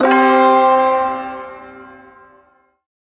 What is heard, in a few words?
Shawzin Chromatic Chord; Warframe; Duviri Ersatz Shawzin; Chords